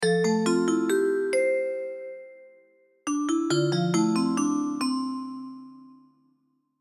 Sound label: Short mistery